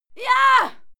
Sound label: female fight scream hyah; schreie; menschen